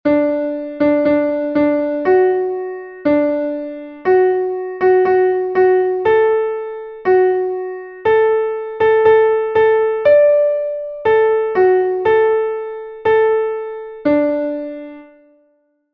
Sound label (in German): klavier; MelodieMeister